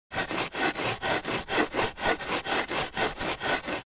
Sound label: Digging in sand with a metal shovel fast